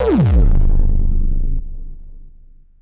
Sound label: Virus blast tone